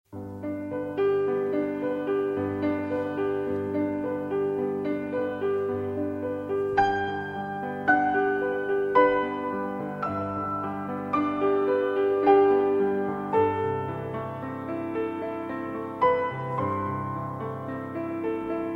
Piano Solo

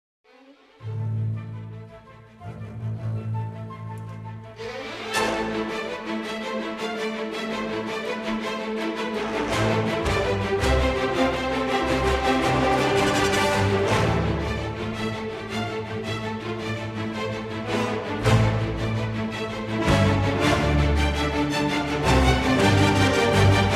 Cannon in D Minor (Orchestral)

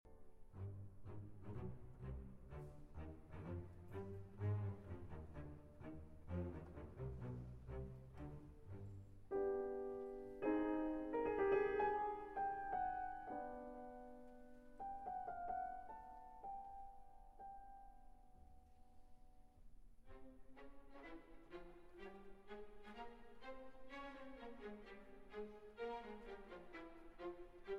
Fantasia in C Minor, Choral Fantasy

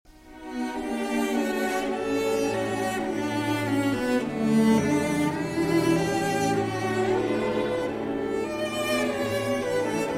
Cello Concerto, Allegramente; Delius

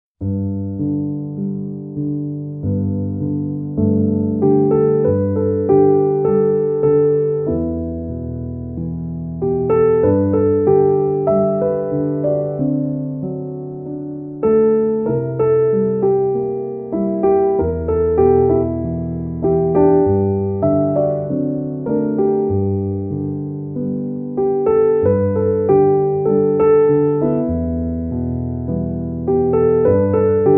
Instrumental Piano

